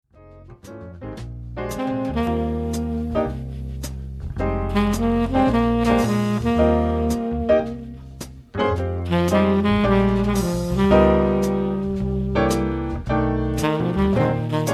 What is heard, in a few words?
Dinner Sax Tenor Sax